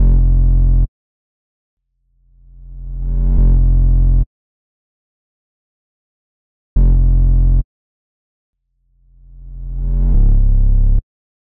142 BPM F Min Bass, drill; Loop Stems; Cymatics; Bastille

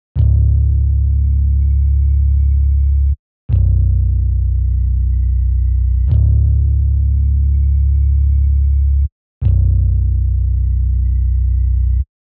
162 BPM C# Min Bass, Loop Stems; Melody; Melodies; Cymatics; 2022 Melody Mystery Expansion; Feelings

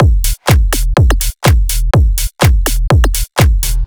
Drum Loop - 124 BPM E, House; Full Drum Loops; Transmit; Cymatics

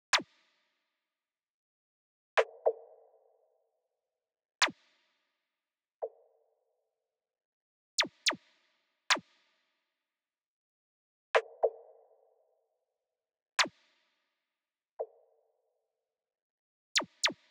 Drum Loop 107 BPM Percussion; Loop Stems; Cymatics; Vibes II Drum Loop Collection; Voyage

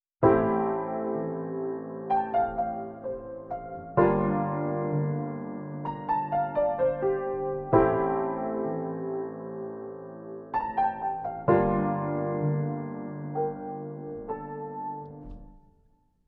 128 BPM D Min Piano; Melodies; Loop Stems; Cymatics; 2022 Melody Mystery Expansion; Temptation

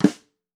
Steel Trap Flam, Snares; Ghosthack - Free Snares 2023

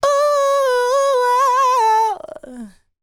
Ad Lib Dry, 100 BPM D Maj, Vocal; Cymatics; Euphoria Vocal Sample Pack; Ad Libs

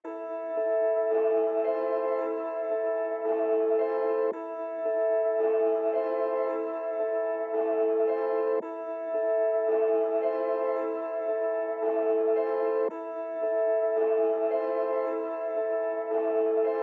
112 BPM A Min Zither Filtered, Loop Stems; Cymatics - 2022 Melody USB Expansion; Blue Pt 2

